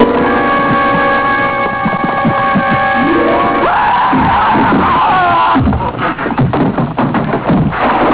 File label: waaah